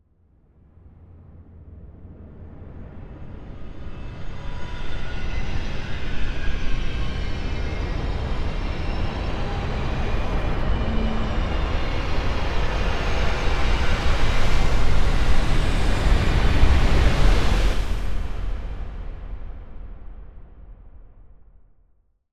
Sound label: Stinger Of Oncoming Horror; Hollywood Edge; sound designer's toolkit v2